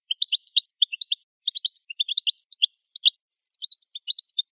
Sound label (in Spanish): toques tiboli, campero